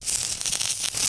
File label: acid spray damage; weapons; dota; alchemist